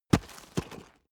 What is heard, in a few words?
stone drop